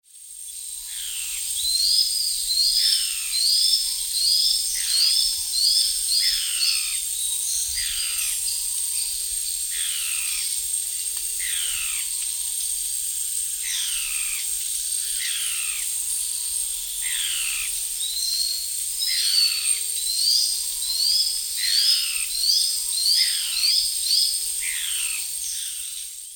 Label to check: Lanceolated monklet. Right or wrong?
Right